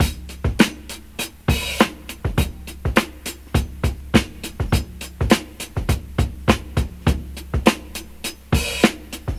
102 bpm high quality rock breakbeat c sharp key

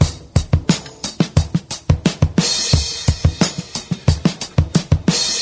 88 bpm breakbeat sample d sharp key

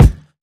good steel kick drum G sharp key; kick drum samples

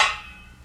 low treble hat sample c key; hat samples

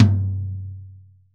long room reverb tom g key; tuned drums g key

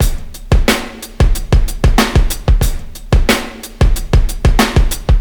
92 bpm breakbeat sample a sharp key; drum loops

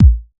low tone bass drum single shot a sharp key

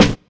clear acoustic snare sample c key

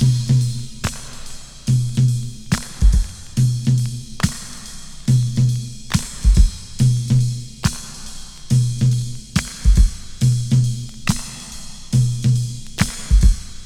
70 bpm drum beat b key; drum loops

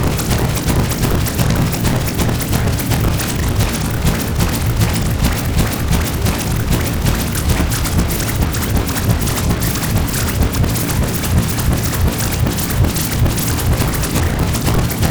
organic toy texture fractured

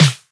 Trebly Acoustic Snare D Key

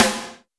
small reverb steel snare drum c key

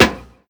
snare drum sound a key

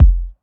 original bass drum single hit f key; kick drum samples

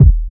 smooth steel kick drum sound D# key

